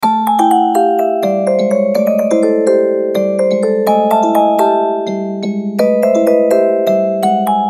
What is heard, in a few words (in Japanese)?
ミステリー オルゴール